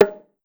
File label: Bongo Hi, Drum Machines DDrum-AT Pitched Down; 300 Drum Machines; Clavia